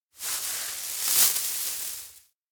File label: weeds part; anemoi